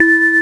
Dong; DS; NEWSMB

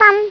spit, Yoshi; DS; ML BOWSERSINSIDE; Yoshi (unused) (from Mario & Luigi Partners in Time)

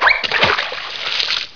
Cartoon Sound With Splash; PC; MARIOSFUNDAMENTALS

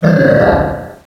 "hooo", Echo inside caves, underground; DS; ML BOWSERS INSIDE

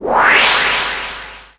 Leave Map; DS; NEWSMB; World Map

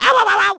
Owowow; DS; NEWSMB

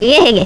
dizzy; GBA; MLSS; Cackletta

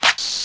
Fuse; DS; NEWSMB

